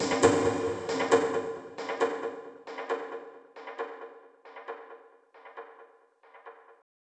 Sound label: Impact; Vengeance essential club sounds-3